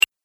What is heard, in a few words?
Click Sound